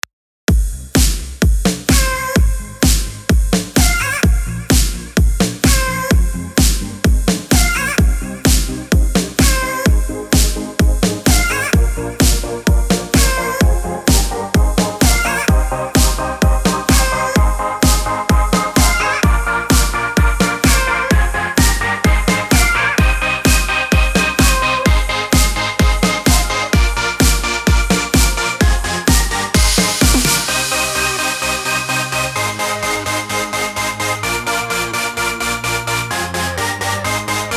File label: TV Noise Remix